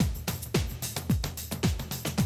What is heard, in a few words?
110, Classic Drum Loops